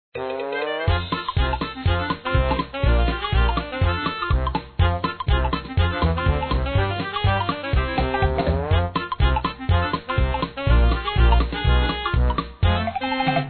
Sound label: game Loop; mom mania